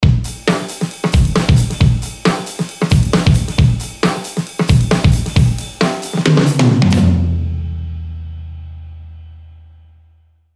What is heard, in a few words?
drum pattern